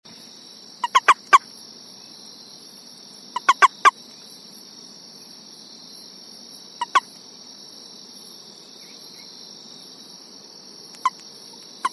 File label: central formosan toad